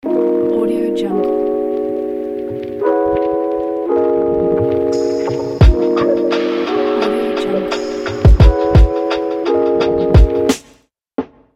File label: lo fi opener